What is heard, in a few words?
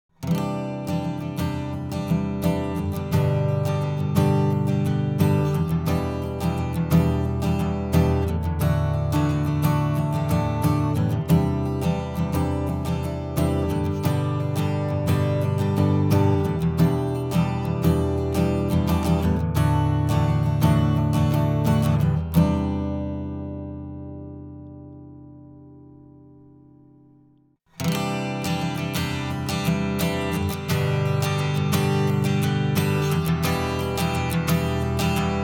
ac gtr chords before after